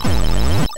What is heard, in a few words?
Eating Fruit